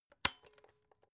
electric whisk switch; haus-garten; kueche

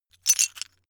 metal pieces clatter short

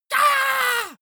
monster growl attack high; schreie

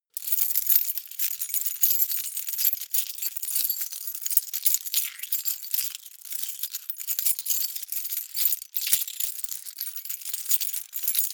key ring large rattle sequence hard; objekte; schluessel

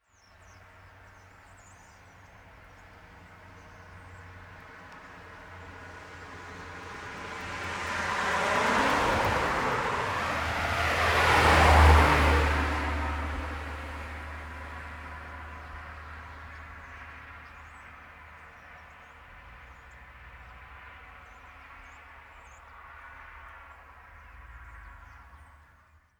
car double drive by opposite direction

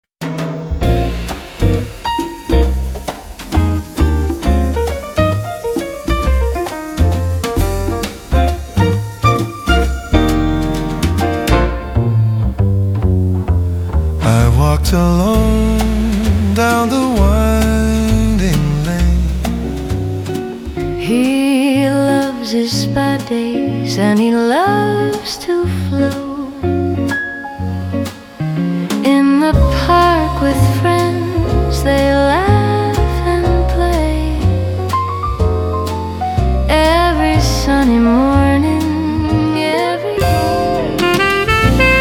bar jazz

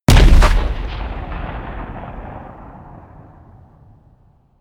blast, hits explosionen metall

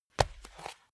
step barefoot wood parquet single hard; menschen; schritte

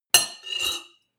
cutlery on plate scrape squeak; berufe; gastronomie; soundpool

